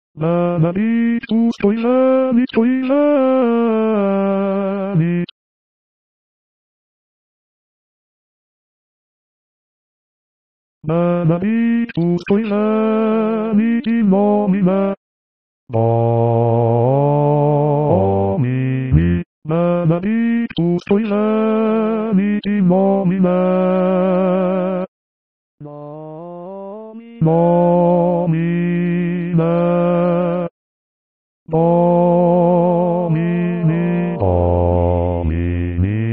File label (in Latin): Benedictus Missa pro pace basses